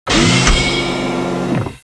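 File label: door open; Babylon 5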